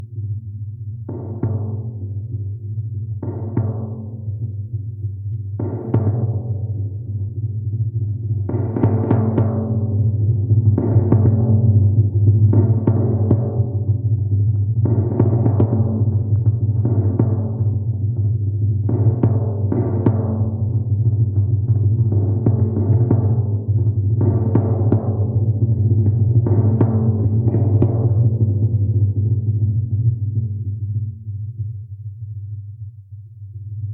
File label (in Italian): Timpani in Movimento